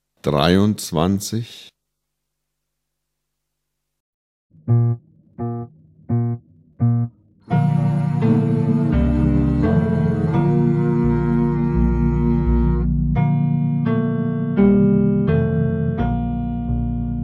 Klang, Hornschule

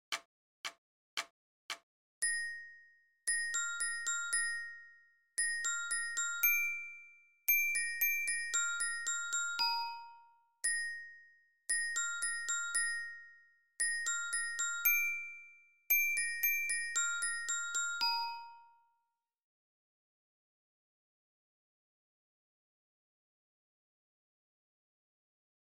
Lyra 1, Spielmannszug

